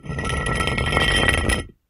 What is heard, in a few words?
stone Drag; Kenney's Sound Pack; Foley Sounds; Rocks